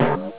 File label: bong; tuxontherun